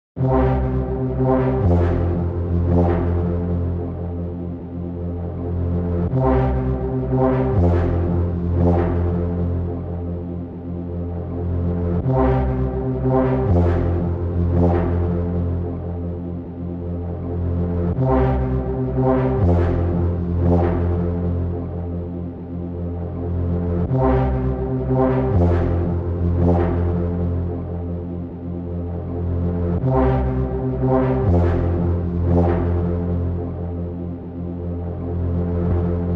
Bass

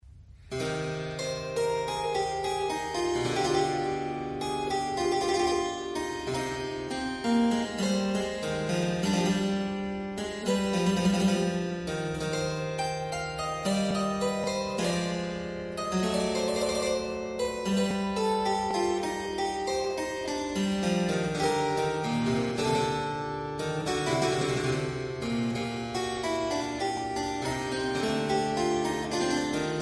kleine Praludien in D minor; BWV940; Johann Sebastian Bach

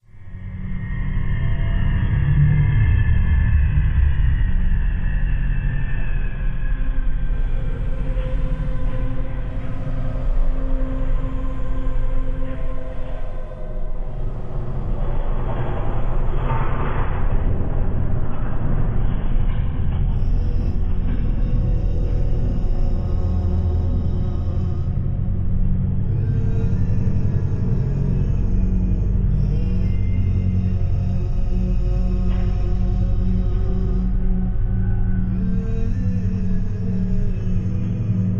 Evil Ritual Ambience

